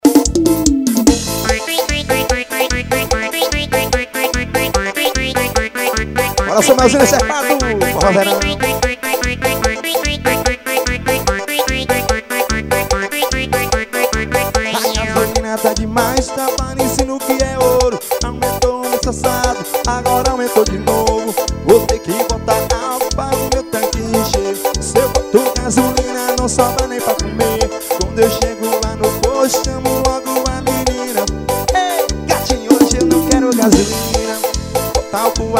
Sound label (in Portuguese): forro verao